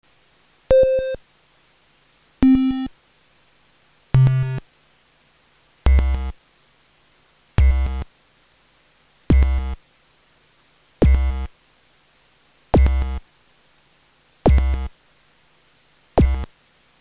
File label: bassdrum